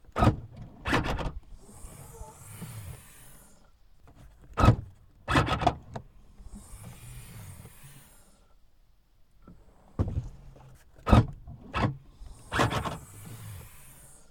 Audi A3 8L 1.9 TDI foley exterior trunk open mechanism mono; Soundholder